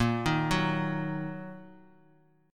Bb Suspended 4th Sharp 5th, Bb, down, Guitar, Standard; Bbsus4#5 arpeggiated; JGuitar